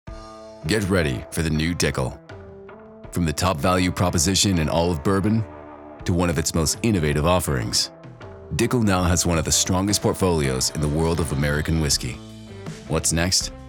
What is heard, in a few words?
Whiskey Sizzle